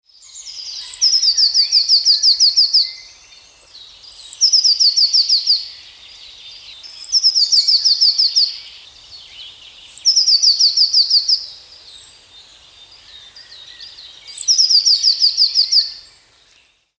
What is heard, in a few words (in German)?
Blaumeise Gesang